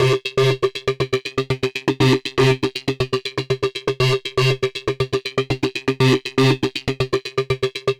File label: Synth